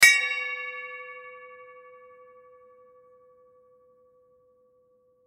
Drum Ice Bell, Drum Lp Ice Bell 6 Inch Single Hit; Drum Kits; Blastwave; Sound Effects:Musical

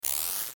Transition Mechanical Chopper Ascend; Multimedia; Blastwave

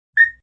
Chirp; Chirps

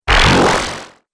Laser Zap, Laser Gun

